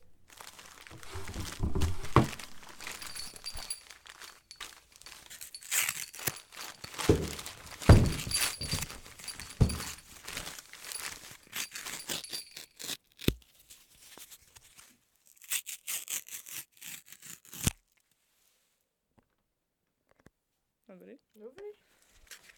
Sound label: Ambiente Decorating The Christmas Tree